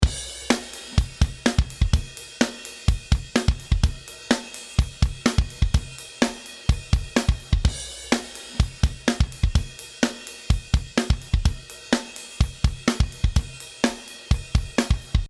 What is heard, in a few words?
Drums 1176